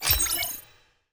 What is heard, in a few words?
ui unlock option